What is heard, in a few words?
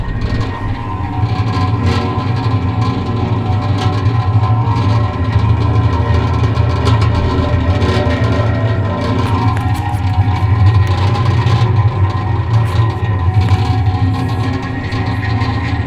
oil barrels